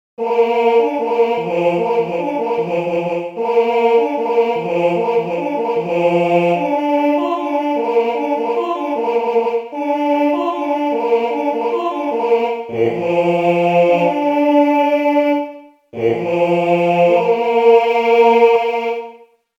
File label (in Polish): Dzik na rozkladzie, sygnaly mysliwskie; sygnaly mysliwskie ze slowami